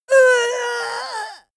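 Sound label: female combat hit hard, screams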